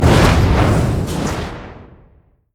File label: summonerspell base exhaust; LoL